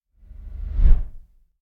Whoosh By Classic Deep And Fast Passing Whoosh Sub; Hollywood Edge; sound designer's toolkit v2